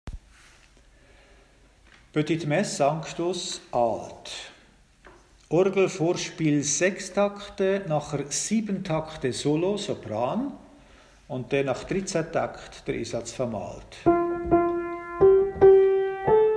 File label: Sanctus Alt